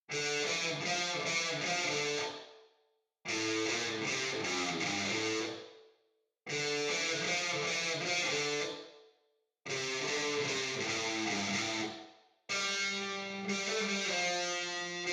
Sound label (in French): solo reverb